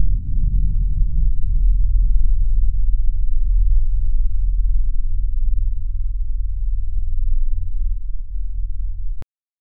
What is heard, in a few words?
create an sfx for a, crystal shimmer